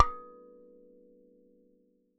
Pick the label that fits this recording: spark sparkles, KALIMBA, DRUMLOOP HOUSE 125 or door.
KALIMBA